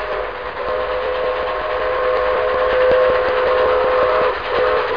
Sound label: Train